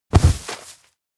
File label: kigurumi grab nape; anemoi